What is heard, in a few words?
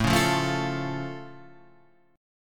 A Augmented A down